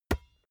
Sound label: basketball catch